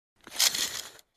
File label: Construction